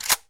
unlock; teenage mutant ninja turtles pizza quest

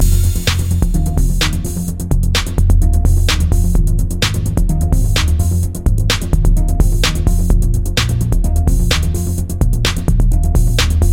music splash lair; teenage mutant ninja turtles pizza quest; tmnt pizza dungeon

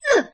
hurt female; nickelodeon basketball stars 2015

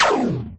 ball lost, basketball; nickelodeon basketball stars 2015

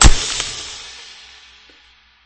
glass break; nickelodeon basketball stars 2015

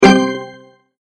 add character; teenage mutant ninja turtles pizza quest

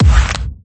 dunk, basketball; nickelodeon basketball stars 2015

